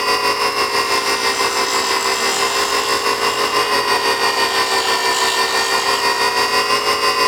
Corpus Ship Kiosk Pillar Loop; Ambience; Props; Warframe; Corpus Ship Remaster

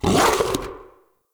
Battle Dog Attack Bark; Animals; Vocals

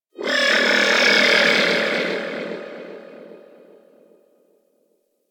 Pursuit Space Ship Call; Grineer; Vehicles

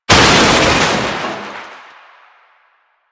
Car Metal Smash; Warframe; Ambience; Nineteen Ninety Nine

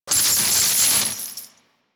Explosion Chains Burst; Warframe; Warframes; Khora

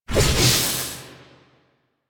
Scythe Charge Whoosh; Melee; Warframe; Archwing; Exalted Archwing Scythe

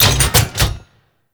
Sniper Mech; Kahl Sniper

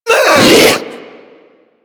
Infested Diseased Ancient Breath; Warframe

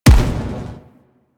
Energy Rifle Split Explosion; Warframe; Venus; Venus Grenadier Spaceman Agent

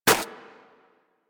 Armadillo Dig Snow, Foley; Warframe; Hunting Critters

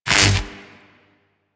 Mining Laser Fire Stop; Weapons; Eidolon Plains; Warframe; Eidolon Mining Lasers

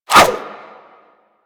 Sword And Whip Whoosh; Warframe

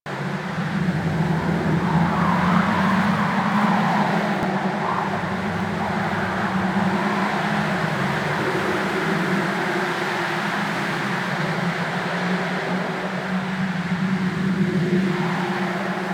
Settlement Crevice Amb, Interior

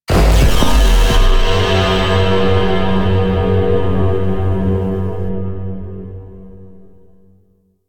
Search Lights Spotted Alarm; Mission One; Warframe; The New War

